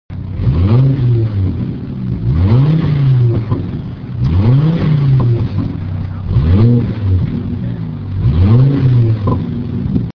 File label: H3 Dual